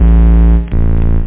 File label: club bass; PhatTrax